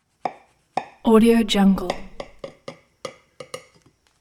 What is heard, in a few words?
knife cutting food on plate